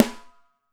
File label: Snare, Alesis DM5